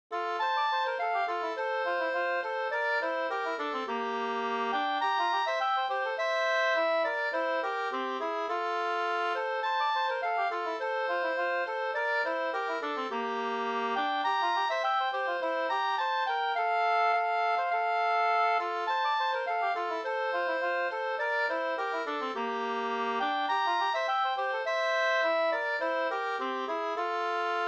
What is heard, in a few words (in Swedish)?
brudmarsch; Sala